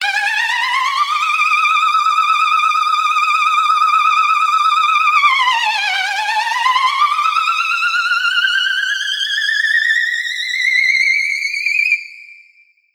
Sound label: Worried Noise